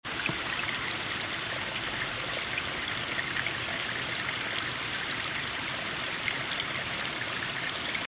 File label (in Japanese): wakimizu